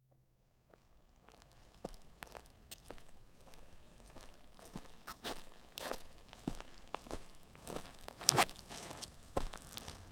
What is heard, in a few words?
walking of humans in reve; human movement